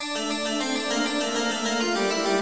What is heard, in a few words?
199 dark ages melody; KEYS ORGAN PIANO LOOPS